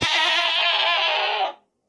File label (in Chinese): 山羊尖叫死亡声